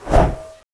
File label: razor knife slash